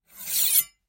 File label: heavy sword sheath